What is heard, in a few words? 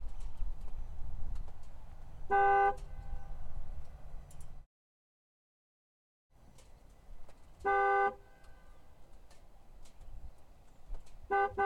McLaren 720S - Horn Various; Pole Position; McLaren 720S Spider SSG 2019; VAR SFX; DPA4061